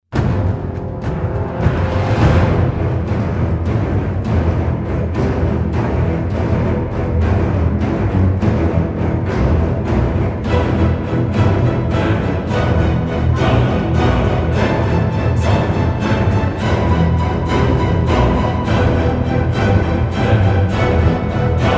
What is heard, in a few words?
battle; warcraft